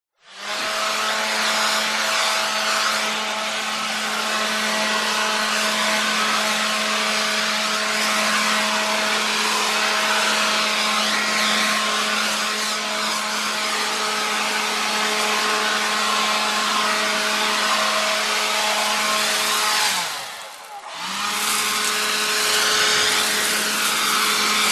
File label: industrial leaf blower operating; zapsplat